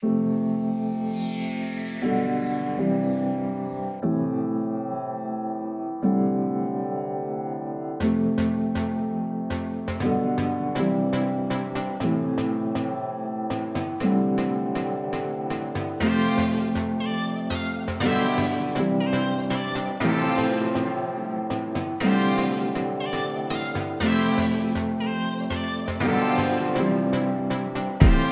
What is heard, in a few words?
glitch